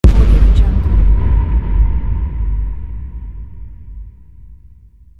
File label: game boom